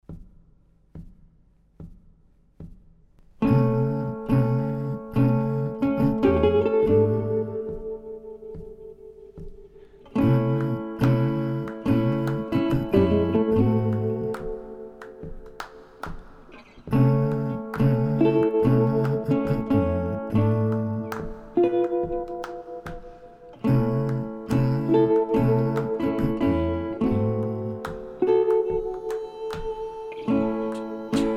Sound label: Lo-Fi Guitar